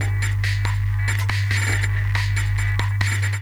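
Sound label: Saver Loop, beats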